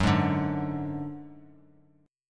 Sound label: F sharp Suspended 2nd Sharp 5th; strummed; JGuitar